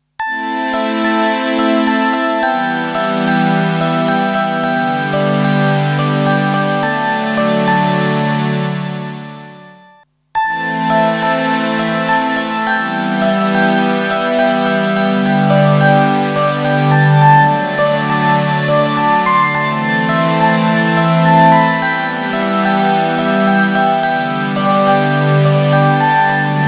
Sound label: Lexicon PCM70 Swarble Synth